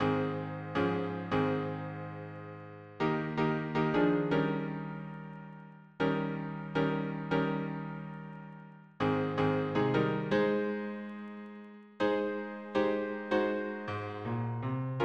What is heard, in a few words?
harmony piano